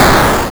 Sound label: explosion